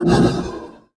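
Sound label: Mech Fidget Vox; Sid Meier's Civilization 4 Complete; Beyond the Sword; Units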